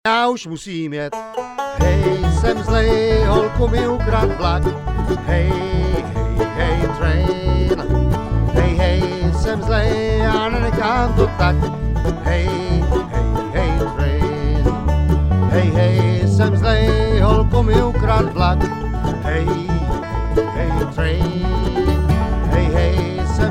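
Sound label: vocal Lead, bluegrass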